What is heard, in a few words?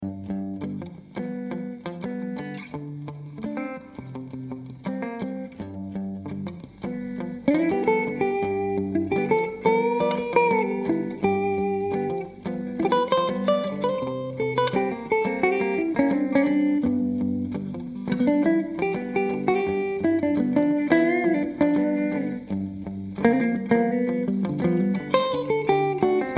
solo snippet, guitar